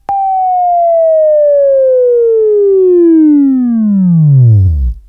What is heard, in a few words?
WAV Left, Sweeping